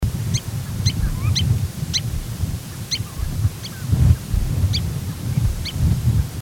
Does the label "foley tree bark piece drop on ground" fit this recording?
No